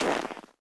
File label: Personnel Footsteps Snow; DCS